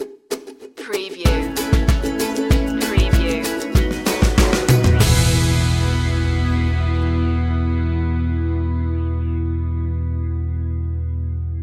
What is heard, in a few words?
uku girl stinger